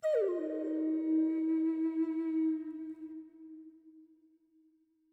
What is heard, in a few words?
Echo Flute; Flutes